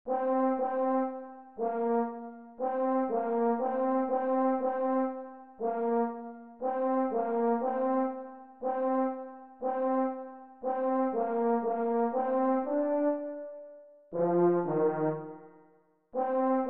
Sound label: F horn part